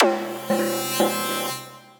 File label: Battlestar Engine, Placid Plucks; Ableton; Mood Reel